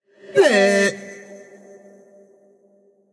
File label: nv tongue; smite; voicepack; hachiman